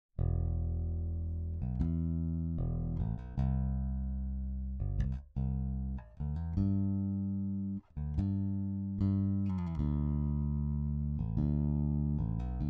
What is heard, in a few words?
bass